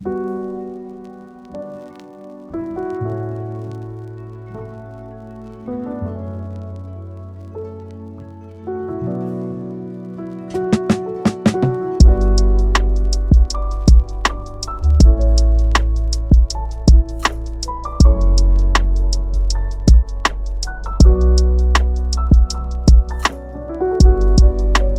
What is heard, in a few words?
Smoothjazz